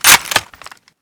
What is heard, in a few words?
bolt forward, m98; weapons; M9K Heavy